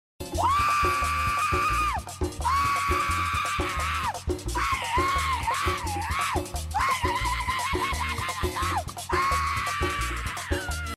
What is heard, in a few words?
waaaaayyyyy